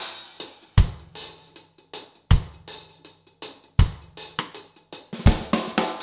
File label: reggae 80